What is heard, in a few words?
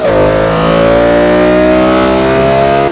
third, vehicles; gmod; tdmcars; r8 v10